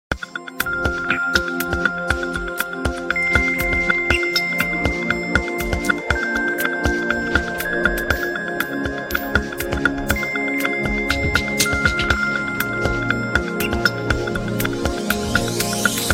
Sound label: nokia lumia ringtone